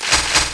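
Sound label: weapon pickup; quake2; mnhcoop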